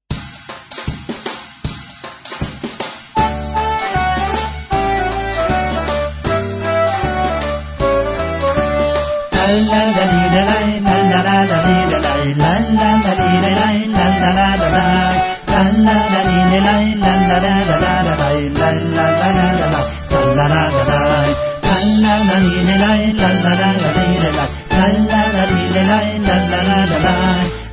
Instrumental

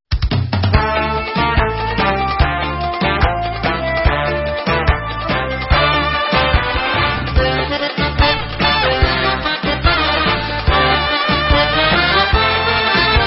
Debka Instrumental

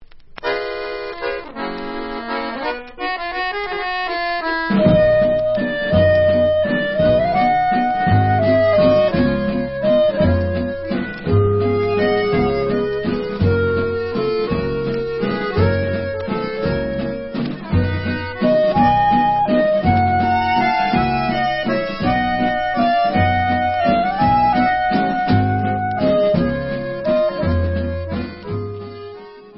Instrumental

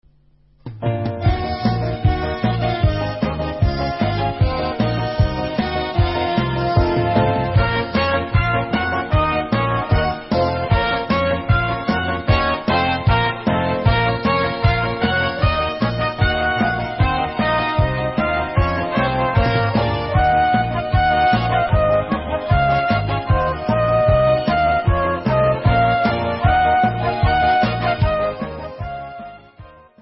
Instrumental

